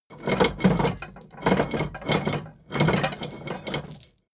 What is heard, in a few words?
drawbridge winch hoist erratic; maschinen; metall; soundpool